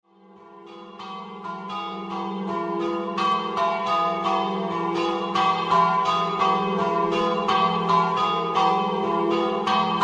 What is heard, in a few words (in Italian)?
CANAL DI GRIVO, campane